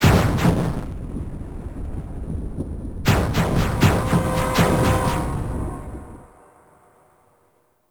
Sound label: Boss Explosion Transition; Warframe; Caliber Chicks; minigame